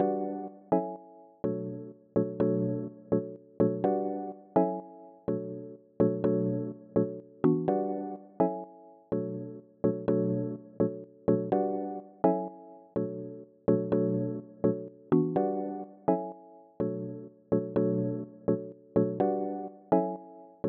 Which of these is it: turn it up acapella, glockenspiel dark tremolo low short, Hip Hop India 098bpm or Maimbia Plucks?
Maimbia Plucks